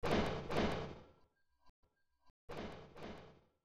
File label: knock